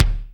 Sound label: BD, DR BD; Best Service Gigapack 2